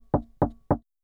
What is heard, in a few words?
authorization